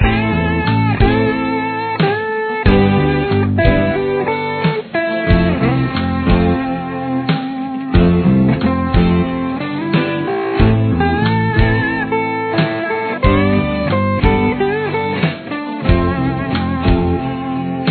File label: am solo, guitar